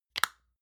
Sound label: lotion large lid close medium; berufe; friseur